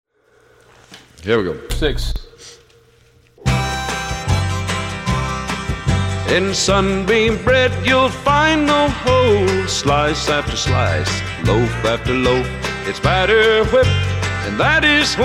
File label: Sunbeam Bread Jingle